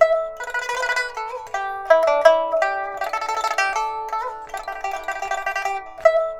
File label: PIPA, 90; INIS - Opium